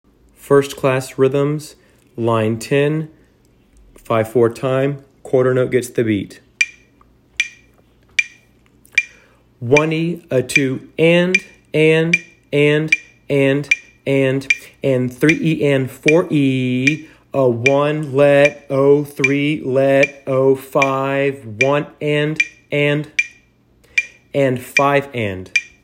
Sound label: rhythms